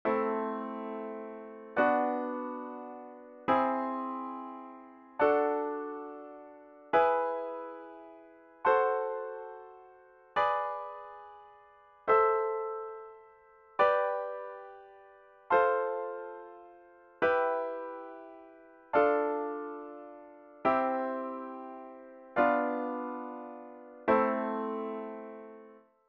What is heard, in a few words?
Minor Chord Progressions Melodic Minor Scales Chords